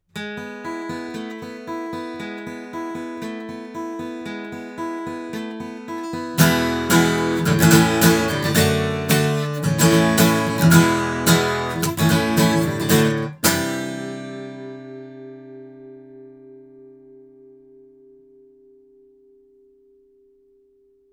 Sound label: omni guitar; Yeti Pro; BLUE